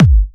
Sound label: Bassdrums UK Trance Kicks; Vengeance Samples